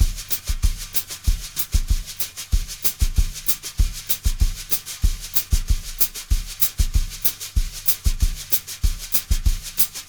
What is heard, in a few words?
BRUSH LOOPS, 95 BRUSHIN